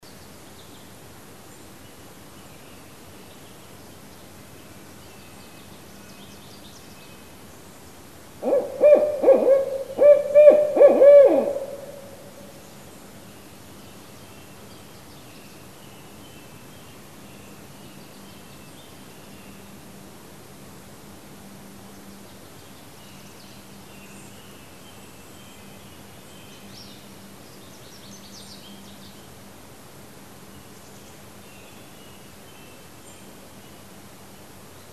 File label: Northern Barred Owl Strix varia